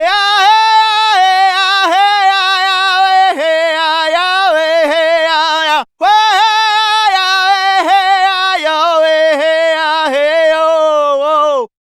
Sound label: Native Vocals, Native American